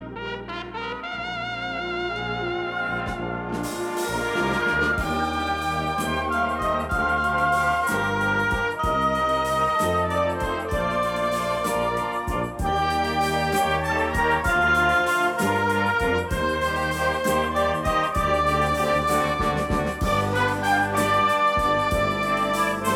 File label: Military Music